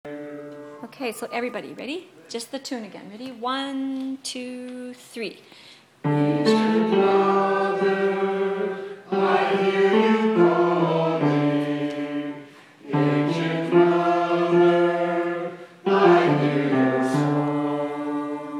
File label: Ancient mother hymn